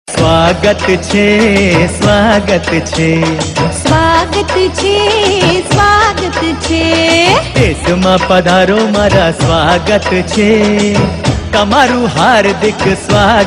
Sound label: Duet Tune; Zee TV; Shobha Somnath Ki; TV Serial Tone